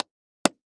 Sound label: First Aid Kit Plastic Locking The Latch; Emergency; AdobeCC